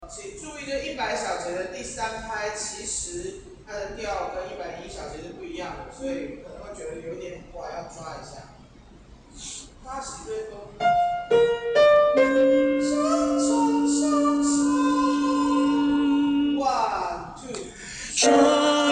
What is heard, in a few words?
tenor